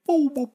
ball bopper; SyrinScape; Syrinscape unplugged; Jungleplanet dinolaser battle

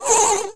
roar; The Elder Scrolls III - Morrowind

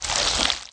slither; The Elder Scrolls III - Morrowind

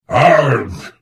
Hit; The Elder Scrolls III - Morrowind

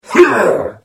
Hit; The Elder Scrolls III - Morrowind

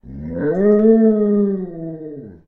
Weird Dog Beast; SyrinScape; Library Depths

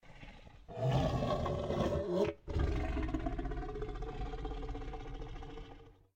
Tiger growl deeper; SyrinScape

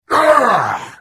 Hit; The Elder Scrolls III - Morrowind

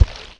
sludge LEFT; The Elder Scrolls III - Morrowind

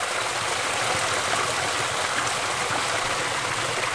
Mourn Fountain; The Elder Scrolls III - Morrowind; Morrowind GOTY GOG

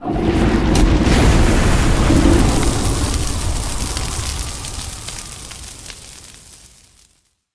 Gold Dragon Breath Weapon Fire; SyrinScape; Vault of Dragons

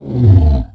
roar

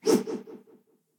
slashes; SyrinScape; Temple of Urgathoa

